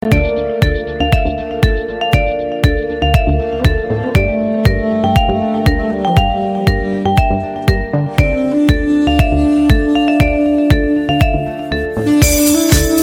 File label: istanbul electro ringtone